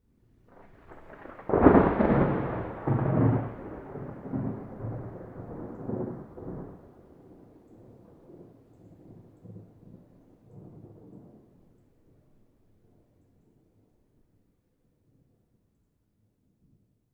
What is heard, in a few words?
Medium Thunder Clap; Mid-Side